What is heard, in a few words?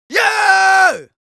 Yells, Viking Vocal